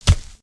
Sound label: hoof ground, step